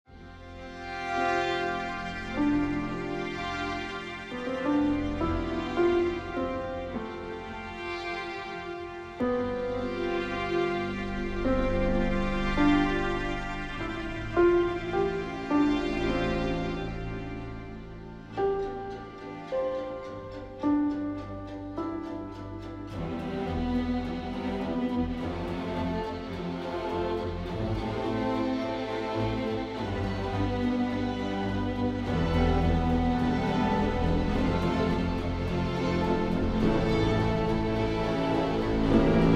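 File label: Rhythmic Textures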